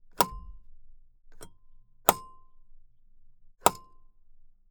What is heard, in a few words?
Fiat 500 Foley Ashtray lid open close; anno 1971; On The Fly Audio